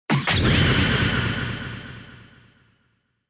Retro Anime Robot Mid

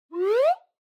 Slide Whistle Short Up

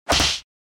Hit Slap, Dry; 打撃 平手打ち01-4ドライ)

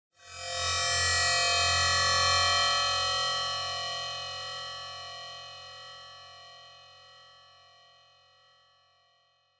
Cyber

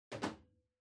Calculator (Far Put)

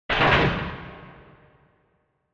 Retro Anime Mech Equip

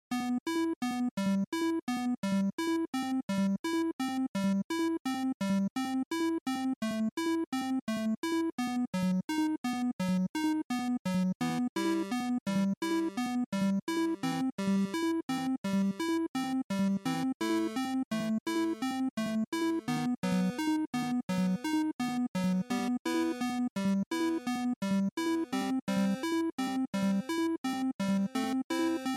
NES RPG Dungeon2

